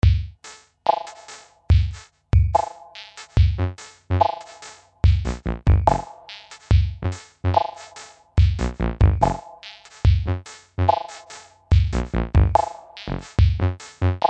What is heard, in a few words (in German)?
Arrangement Solo Laut